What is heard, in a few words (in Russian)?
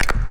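звонкий щелчок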